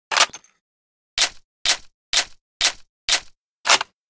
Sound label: springfield Reload; Modern Weapons Pack